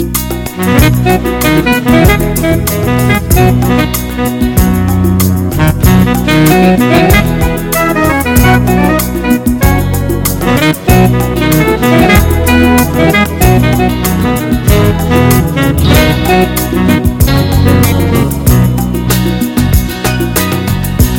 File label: Slow but Happy